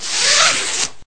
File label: grappling; blobAndConquer; combat